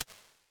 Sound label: sn short, Convolution, Small Rooms, IR Samples